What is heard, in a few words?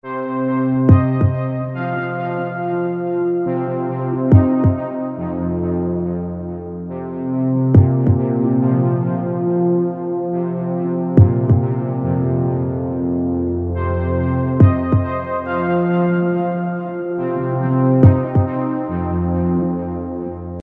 Trance; magic maze